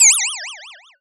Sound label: humorous scene, anime